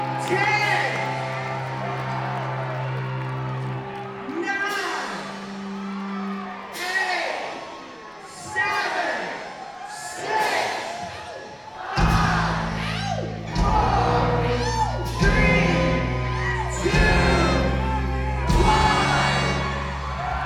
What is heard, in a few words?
New Year Countdown, Live; Wiltern Theatre, Los Angeles, CA